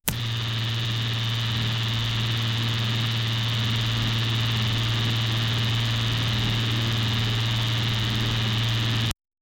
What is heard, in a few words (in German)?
erstelle einen elektromot, locomotive